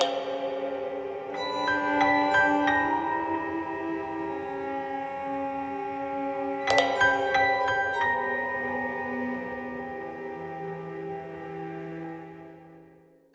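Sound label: music ocean abyss suspense; rayman origins 2011 gamerip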